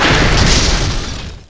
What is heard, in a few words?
crush